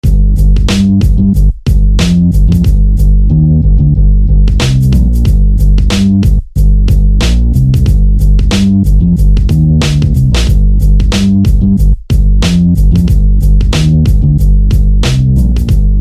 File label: Legend Bass; SFB FX